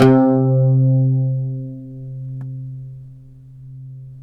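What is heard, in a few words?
pluck OUD C; Roland - LA Composer vol. 3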